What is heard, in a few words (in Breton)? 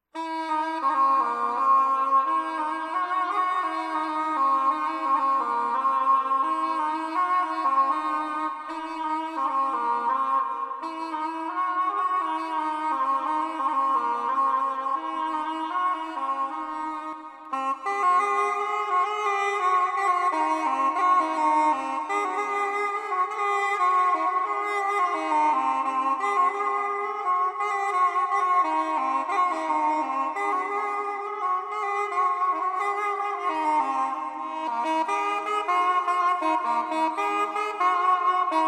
Hanter dro; à Strakal